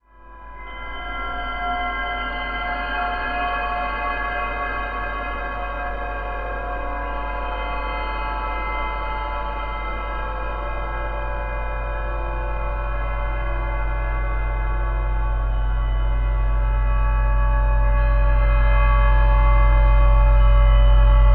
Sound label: Slow Bell Drone